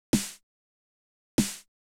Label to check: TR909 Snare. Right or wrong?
Right